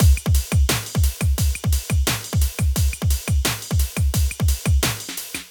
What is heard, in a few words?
DnB Half 174bpm